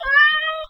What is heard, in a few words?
cat; NewGamePhysics; GravityChooser